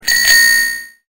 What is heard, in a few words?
bicycle bell